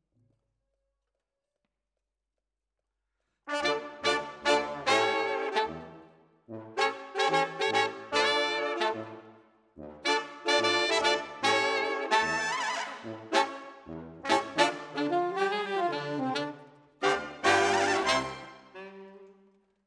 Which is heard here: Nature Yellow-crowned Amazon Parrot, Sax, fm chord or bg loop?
Sax